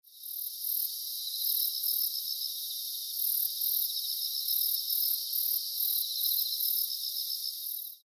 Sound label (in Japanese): mushi aki; 秋の夜中に鳴く虫たち・環境音・10月版その2; 時期が違うと鳴いている虫たちも変わるようです